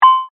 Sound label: Multimedia Chime, Technology, Multimedia, Chime